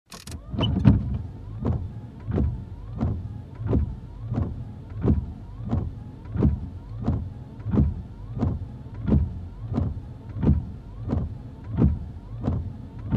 Windshield Wiper

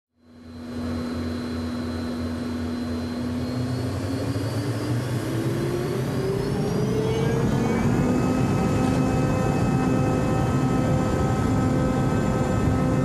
Aircraft Taking Off Cabin Ambience

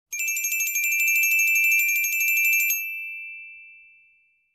Sweet handbell ring

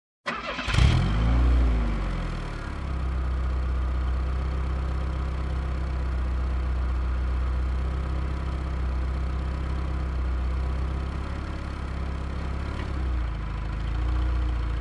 Car engine ignition and drive away

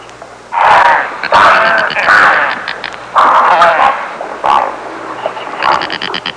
Wetlands bird sounds and ambience

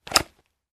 Putting away weapon

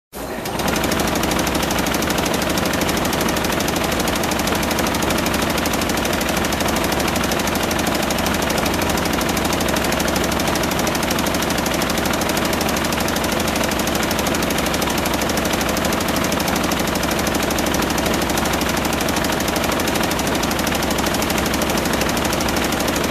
Industrial Machine Motor running up-close

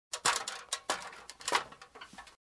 Climbing metallic stairs

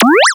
Arcade Game Jump Button Sound

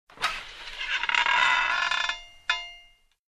Creaky Metal Compartment opening

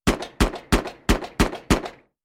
Semi-automatic Pistol Shots

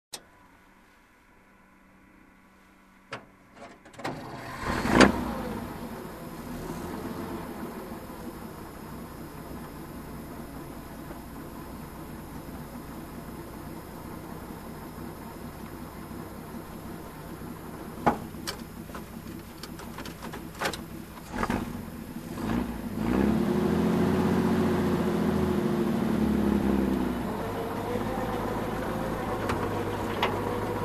Tank engine sounds

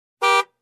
Short car honk

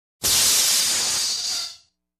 Oxygen Hiss with metal squeak

